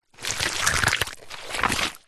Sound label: foley wood stick movement slide draw line in sludge wet mud squelch